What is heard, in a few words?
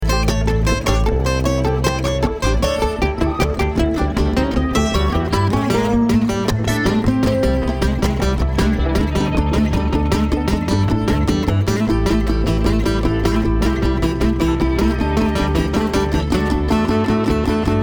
Traffic Jam